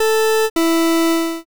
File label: time up